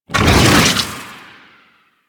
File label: Stalker Energy Sword Toss; Warframe; Sentient Stalker